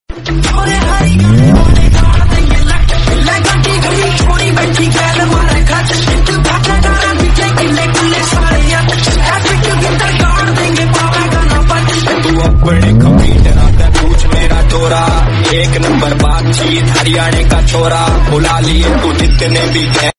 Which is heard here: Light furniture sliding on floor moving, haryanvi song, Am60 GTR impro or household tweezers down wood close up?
haryanvi song